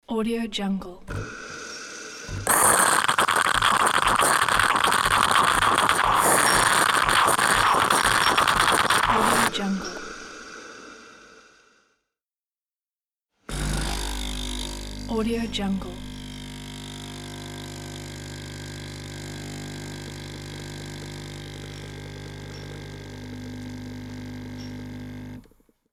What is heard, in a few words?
coffee machine prepearing cappucino